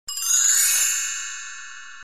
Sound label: open book